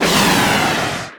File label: missile, aircraft; combat; CosmicRage